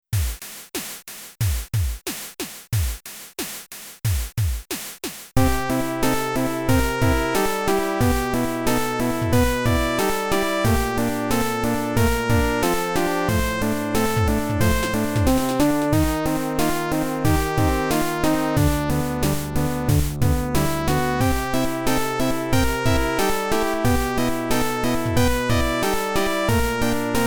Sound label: Internal Percussion